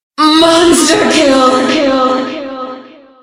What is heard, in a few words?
music stinger; killstreak music